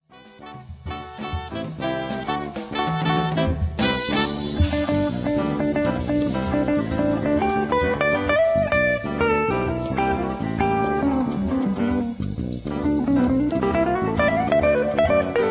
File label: guitar solo